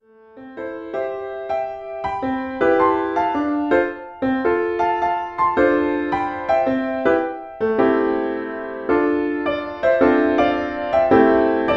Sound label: intermediate piano solo